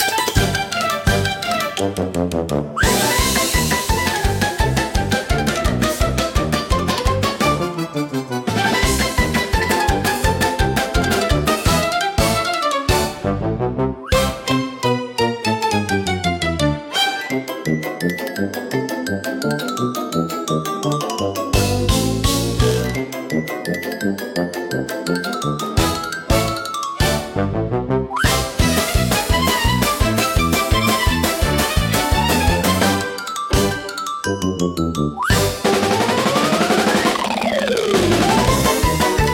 Tuba Tumble Parade, comical orchestra for slapstick gag scene